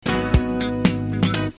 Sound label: G sharp Min